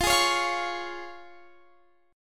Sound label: F Minor Sharp 5th, Guitar, Drop D